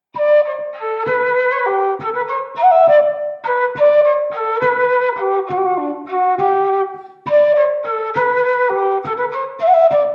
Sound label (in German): Waltzer